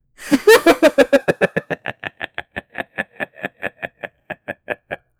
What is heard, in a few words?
guy awkward laughing voic